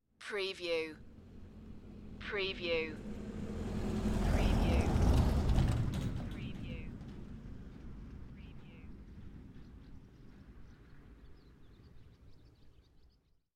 Farm vehicle and trailer drive by on country road in Wales; beanstalk audio